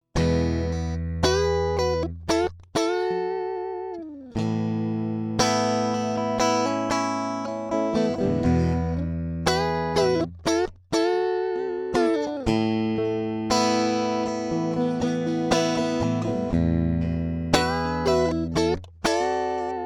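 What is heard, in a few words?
Vibroverb + Fuzz unprocessed; fender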